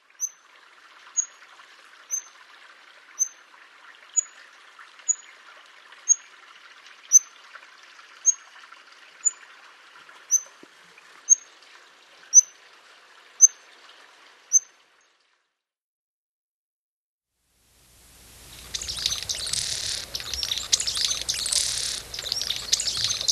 Blue throated Hummingbird

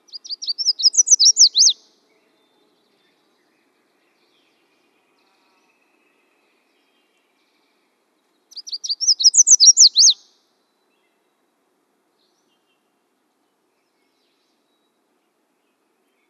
Red faced Warbler

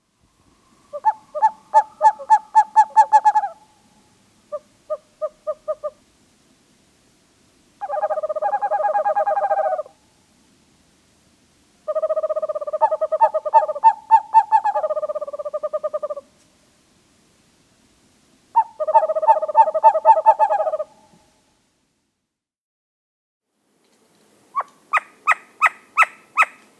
Western Screech Owl

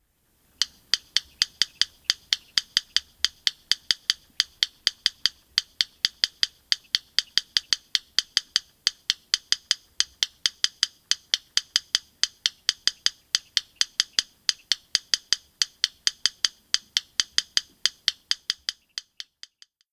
Yellow Rail

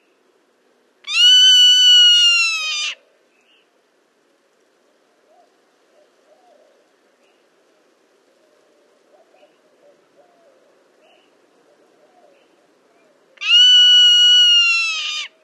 Zone tailed Hawk

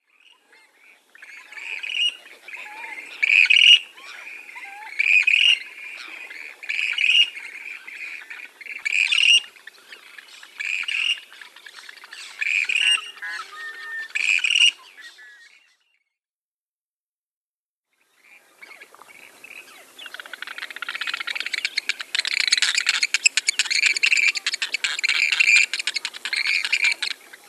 Western Grebe

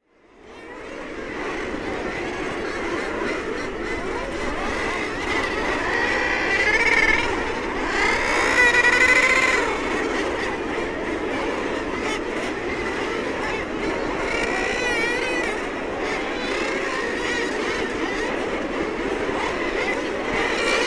Common Murre

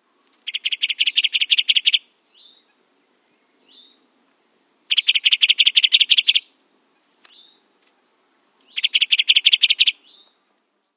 Bridled Titmouse

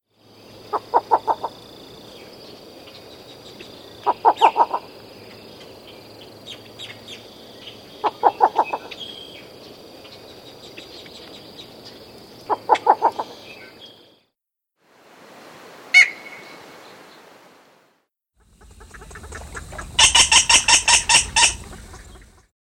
Least Bittern

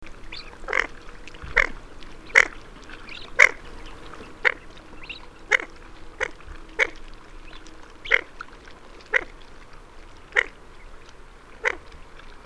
Barrows Goldeneye Bucephala islandica

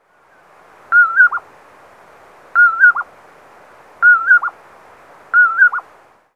Common Poorwill; Wikimedia Commons

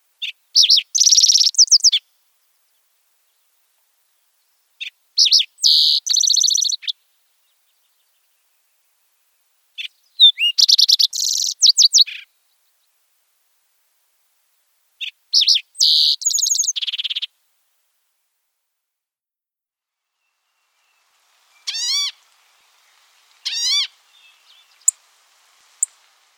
Green tailed Towhee

